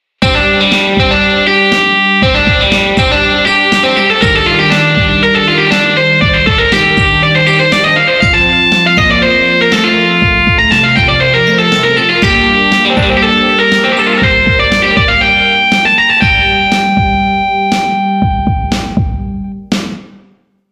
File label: drive guitar